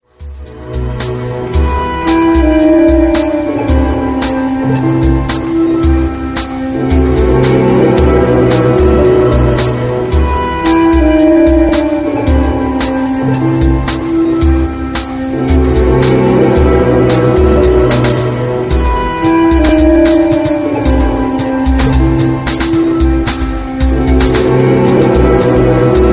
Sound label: Drum Tango